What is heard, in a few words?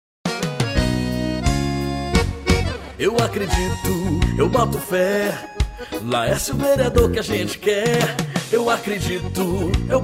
Jingle